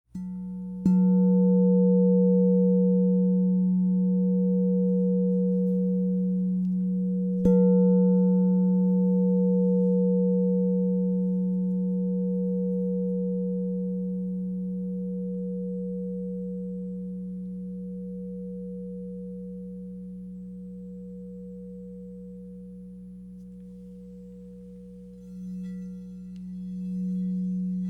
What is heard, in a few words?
Crystal Tones Singing Bowls